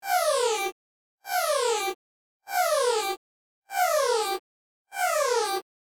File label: Science fiction cockpit alarm loopable